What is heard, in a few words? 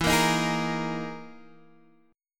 E Major 7th Flat 5th, Guitar, Standard; EM7b5 strummed; x,7,8,8,9,6; JGuitar